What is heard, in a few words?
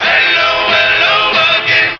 hello hello again SUNG; Voice; introductions; hello hi hey